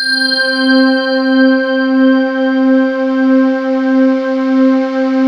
bellure C4

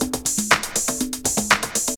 LOP, Drum Loops; Big Fish Audio; Prosonus 500 Drum Loops

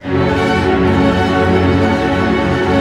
ARP F#, STR ARP MIN; Sound On Sound - Peter Siedleczek's Orchestra

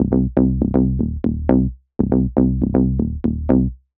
Skip Bass; Sonic Foundry (Sony Creative Software); The Electronic Music Manuscript Collection; Bass Foundations

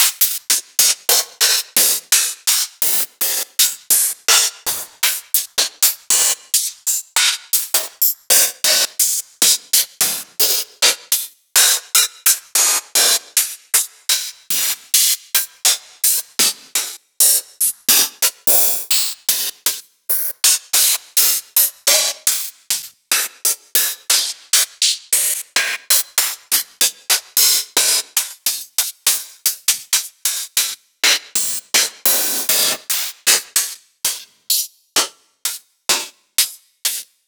Open Hats Synth Open Hats; ghosthack

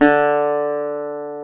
GTR, Dobros, National

